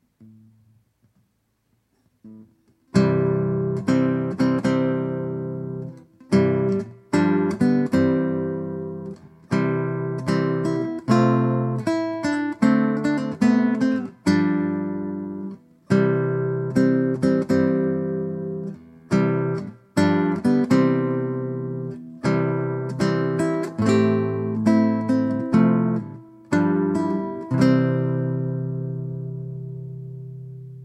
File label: Wedding Ceremony Solo Sample